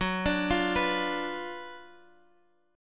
F sharp Major 7th Suspended 4th Sharp 5th, Guitar Half Step Down